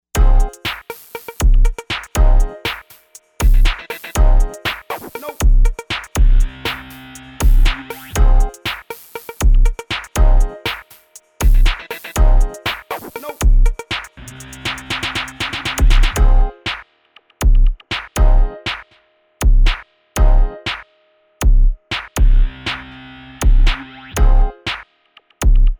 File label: GLITCH; Factory patterns